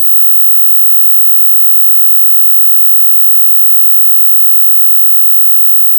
firefly chimes 1600 hz; awesome

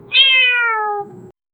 a friendly meow sound, cats

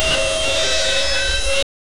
sci fi workbench work; completion

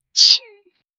a passionate kiss between; human interaction

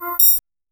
ui success sound

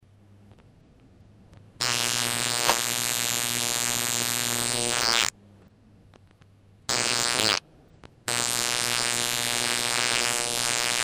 electronic fart

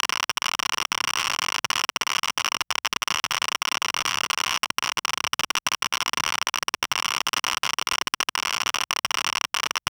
electronic

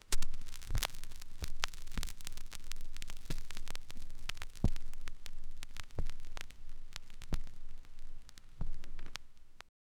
vinyl needle drop record

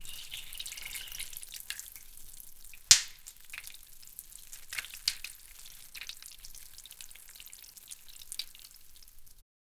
sloppy stinky gloopy cow

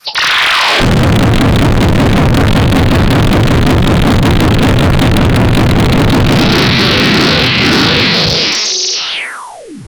ai artificial intelligen

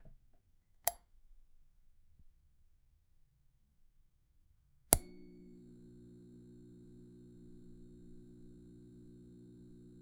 light bulb flickering and, electrical

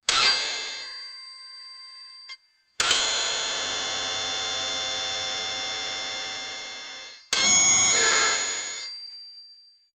air wrench

